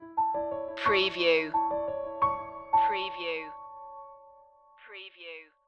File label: bridge stinger